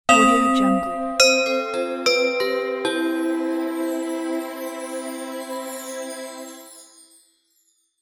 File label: bless bells announce